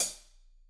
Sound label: HH, Drums; Bob Clearmountain Drums I